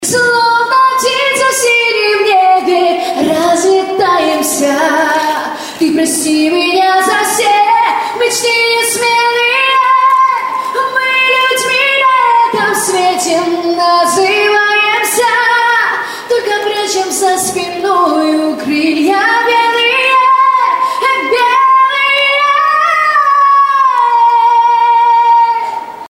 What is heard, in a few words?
acapella angel; rus; ringtones